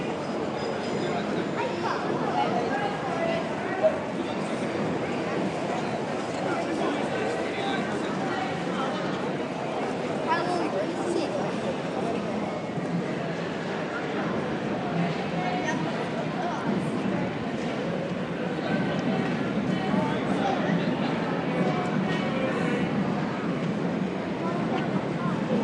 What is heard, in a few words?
Borough Market London ambient